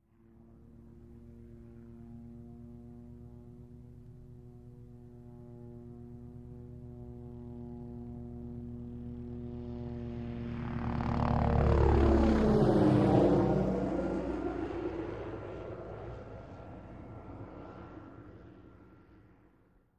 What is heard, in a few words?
OH6 Loach By Fast Ster; little bird